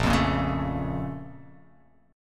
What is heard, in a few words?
A sharp Minor Major 7th, down; A#mM7 strummed; JGuitar